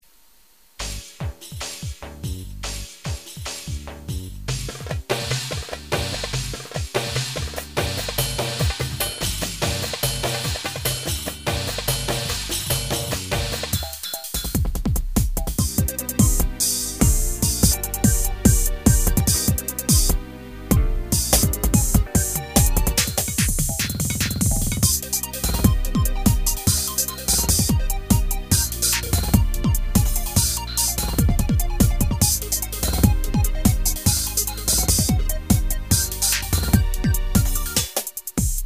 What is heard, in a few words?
9 8 mix